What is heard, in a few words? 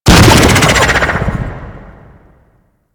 Disc Proj Explode; Warframe; Corpus Disc Osprey